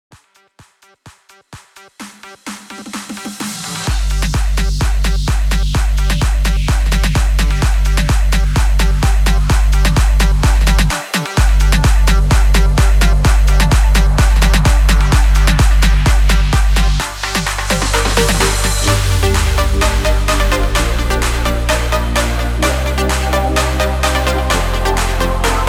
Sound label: 128 BPM